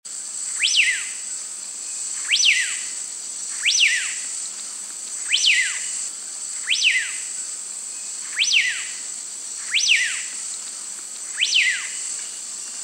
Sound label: Monarcha Frater Canescens; Australian Black-Winged Monarch; Call